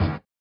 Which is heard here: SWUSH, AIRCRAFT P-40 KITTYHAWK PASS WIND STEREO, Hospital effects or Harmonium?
SWUSH